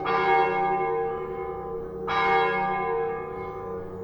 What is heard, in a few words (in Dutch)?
kerkklok